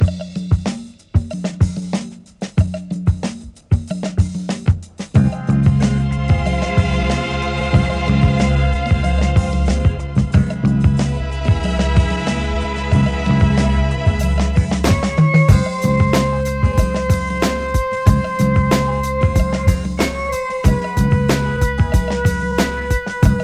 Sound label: Tropical Club